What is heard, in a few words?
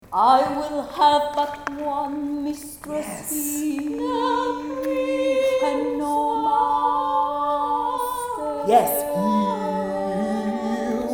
Quartet